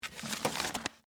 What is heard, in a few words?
Mail Box Insert, Household, Mail, Box, Insert, Mail; Miscellaneous Household; BlastWave